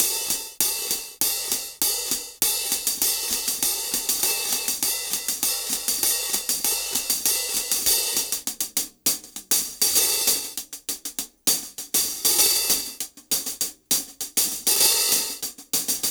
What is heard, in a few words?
Cym Beat 99 BPM Hats; AMG - Keith LeBlanc Phat Butt Jams